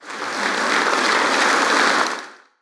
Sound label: Applause